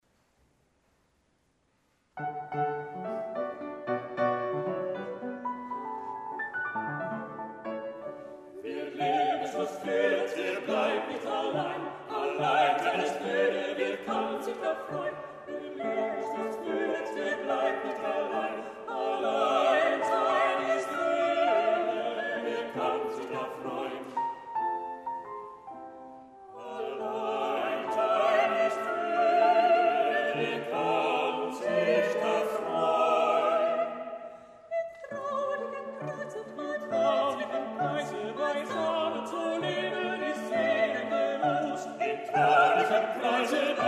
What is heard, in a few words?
Schubert Lebenslust, Kwartet, Oud katholieke kerk; Amsterdam